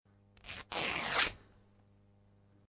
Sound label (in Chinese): 音效 撕裂衣服